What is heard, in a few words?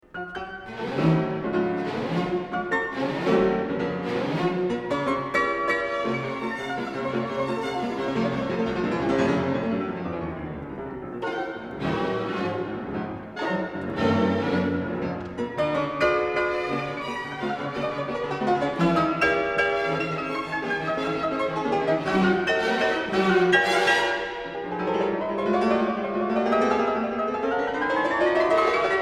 Piano Concerto; Franz Liszt; Piano Concerto No. 2 in A Major, S. 125; I. (b) Allegro agitato assai - Un poco più mosso